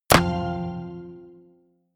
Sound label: medieval click medium positive